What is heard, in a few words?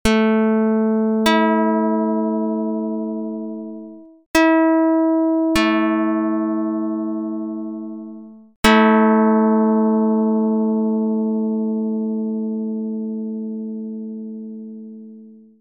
Jid 28 19 pluck adu dr220